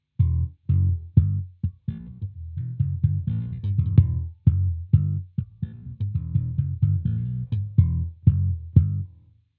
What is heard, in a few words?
slap no tone, bass; fender bass roadworn